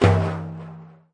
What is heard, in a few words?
arabic perc, Arabic Percussion Kit